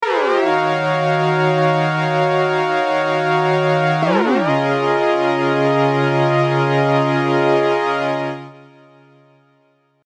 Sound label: Keys High, Deep House; Unison Deep House Collection for Serum